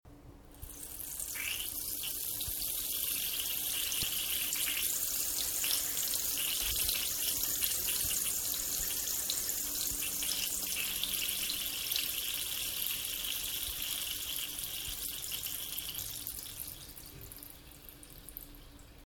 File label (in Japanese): しょうおーっ おしっこ 我慢してた あと